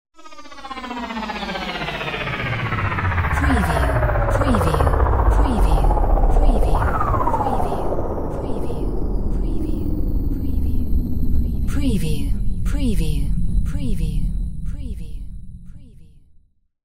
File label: SCIFI SPACE VEHICLE LANDING